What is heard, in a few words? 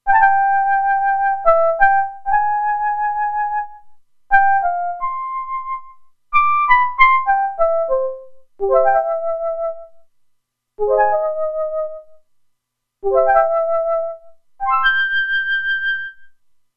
Flute, Roland Alpha Juno-2; Original Sound Chart patches